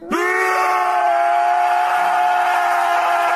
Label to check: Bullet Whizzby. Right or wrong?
Wrong